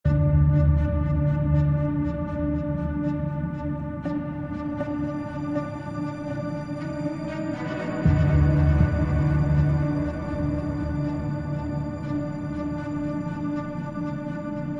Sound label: music anticipation